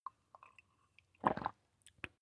drink swallow

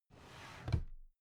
drawer cabinet carton close regular halfway

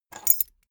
key ring small grab table hard

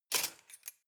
cutlery large multiple drawer grab

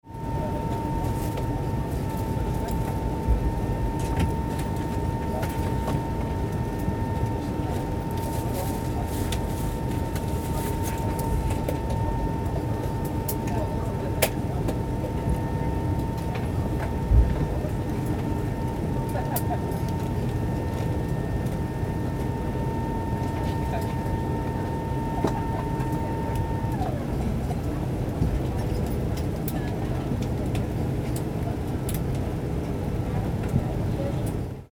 a319 jet cabin take seat after boarding

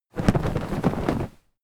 flag medium flap

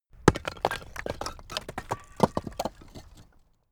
bricks clatter

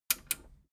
metal button radio click enable slow

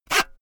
saw use short fast

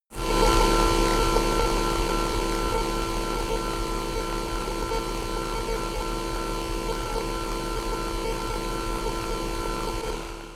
noise scanner

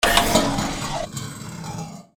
machine burst

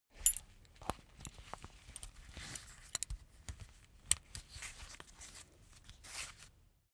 leather belt close soft

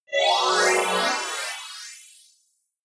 appear sparkle long

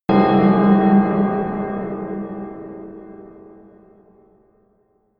melodic disharmonious bell low

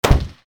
shot

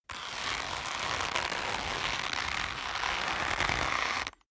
leather creak long medium

